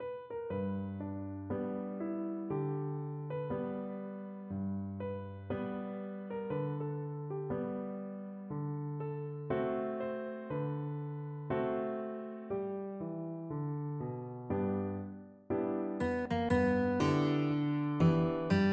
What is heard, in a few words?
guitar